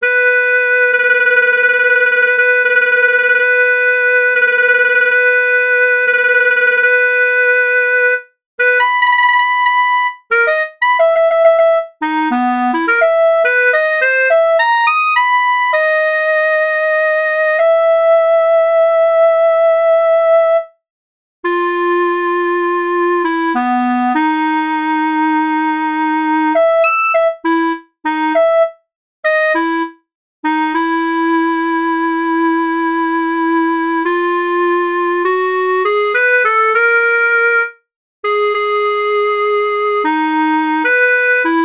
Aforisma clarinetto in Sib

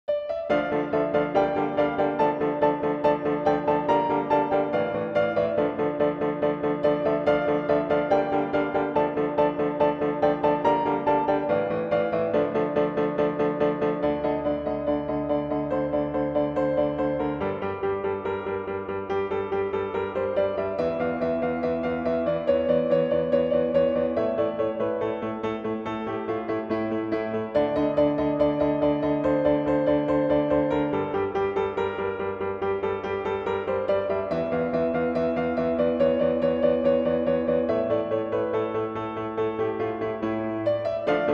concerto per piano; Op.132 n.4

